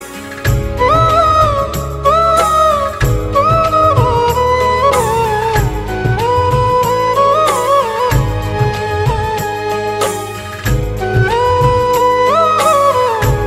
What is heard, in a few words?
Flute Ringtone